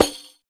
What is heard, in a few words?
Rek Sak; Roland BK-5; Percussion